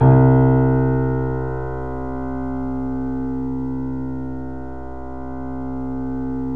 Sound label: 3D Boesendorfer